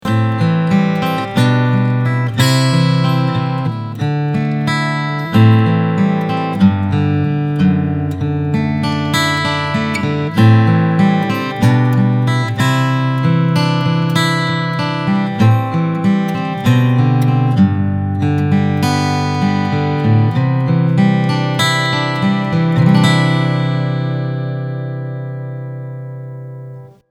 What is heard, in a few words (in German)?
Picking Mikroabnahme